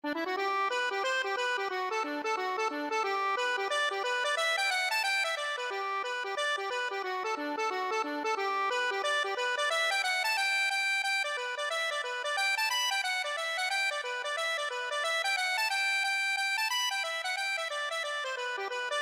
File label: four hand reel, accordion